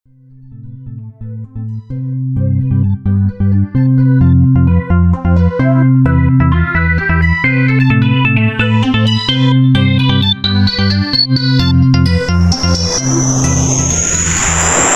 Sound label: Disco theme